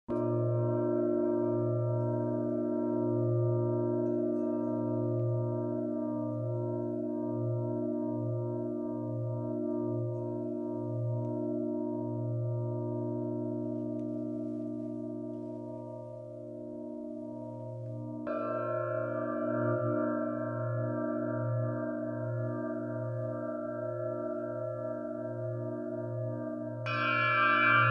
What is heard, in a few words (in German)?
klangpyramide